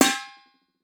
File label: Impact Bowl Metal Hit By Copper Pole